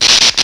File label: zap, machines; ambient; Counter Strike Model Pack; CS:GO